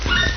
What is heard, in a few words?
double cheep, humpbacks; salish sea data examples; signals